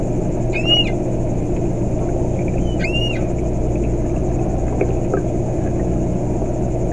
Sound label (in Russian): lamantin